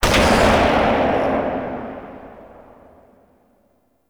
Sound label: Quad Sniper Tail; Warframe; Weapons; Tenno